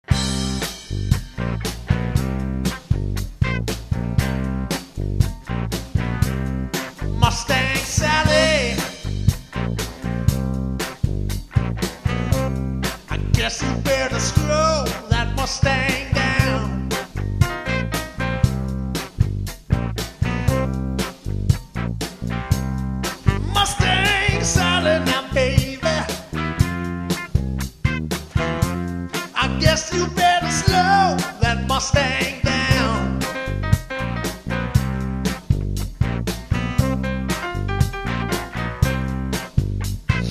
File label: Male Vocals